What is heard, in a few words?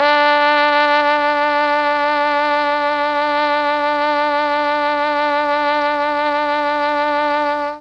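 D3 trombone trumpet, mellotron; TROMB+TRMPT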